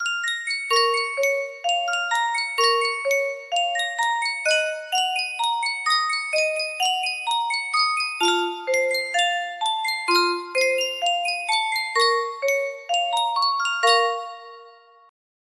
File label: music box